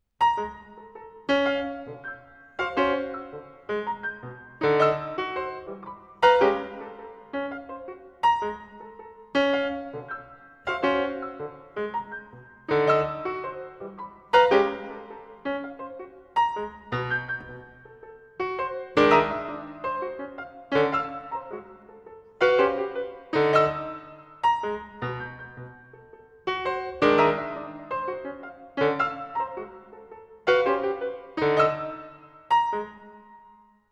Variations for Piano, Sehr schnell; Boulez

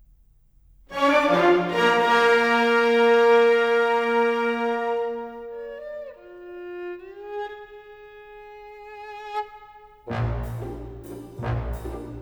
Preludes for piano

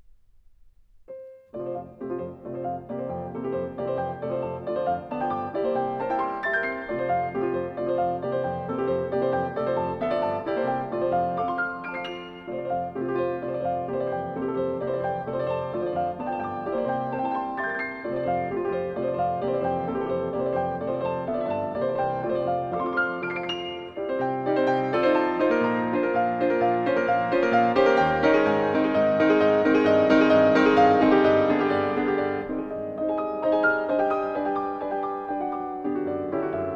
Etudes for piano, F major, Allegro; Chopin; Etudes op 10 25

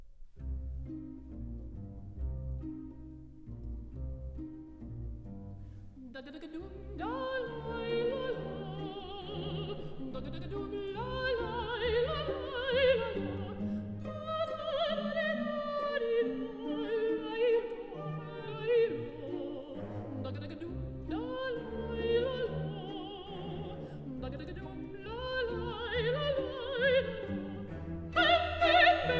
for soprano & piano; Bernstein; I Hate Music, cycle of ＂5 kid songs＂; No． 3, ＂I Hate Music＂

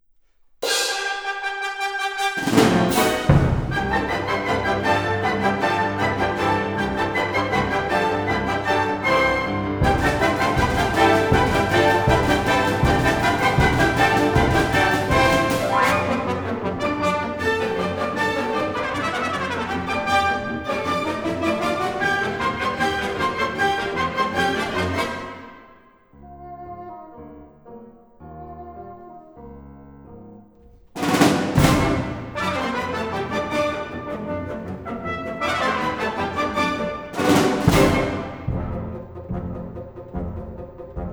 Suite for chamber orchestra, Galop; Stravinsky

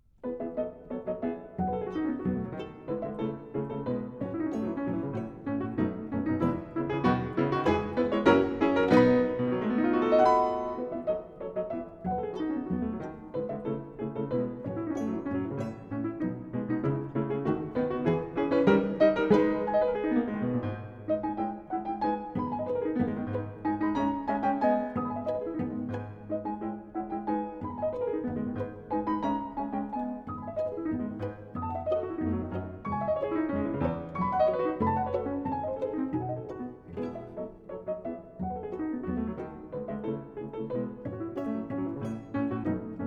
Piano Trio in A minor; Tchaikovsky; ＂In Memory of a Great Artist＂; Op． 50

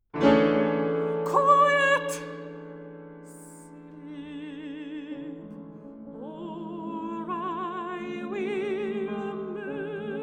song cycle for mezzo-soprano & piano; A Charm of Lullabies

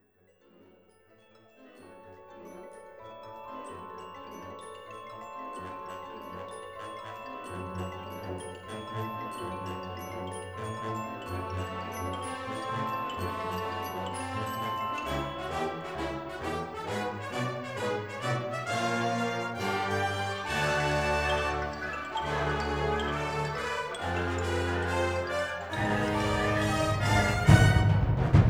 Wind Ensemble; kimber kable Isomike Tests 2005b

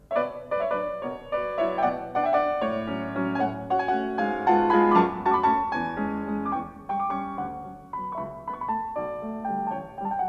Waltzes for piano, 4 hands (or piano), in B Minor; Brahms

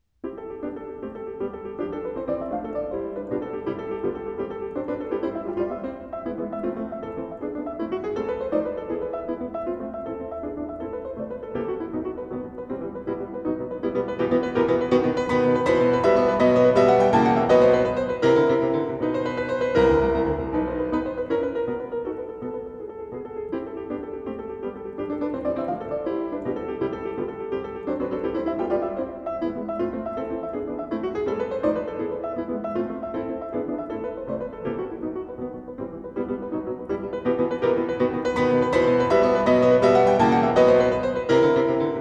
Songs without Words for piano in C major; Mendelssohn

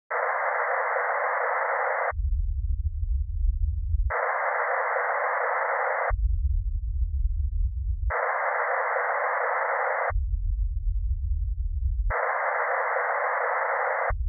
Subwoofer level; Subwoofer Evaluation and Setup; with meter; Surround Spectacular

